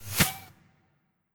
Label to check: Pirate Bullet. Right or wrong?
Right